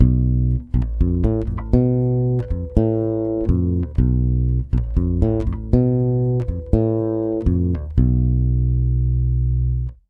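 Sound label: 120 bass B diminished, brazil bossa; USB Soundscan; AKAI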